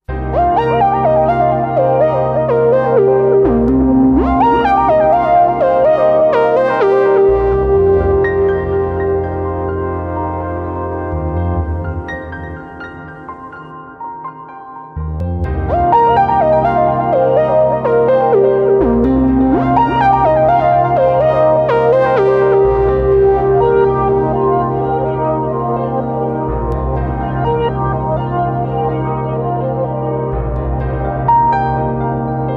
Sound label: Ambient